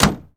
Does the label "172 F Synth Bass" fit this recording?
No